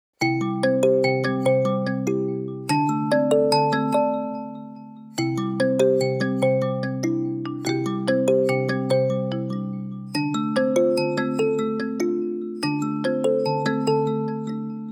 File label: Array Mbira, ringtones; Vivo X80-Pro